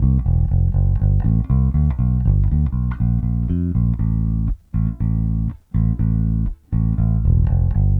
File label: Bass, 70s Analog Funk; Sonic Foundry (Sony Creative Software); What it Is!; Classic Caddy